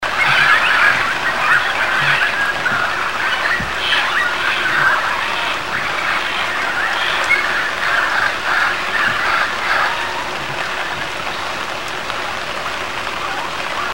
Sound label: Amazona brasiliensis; Jd Bopiranga; Itanhaem; SP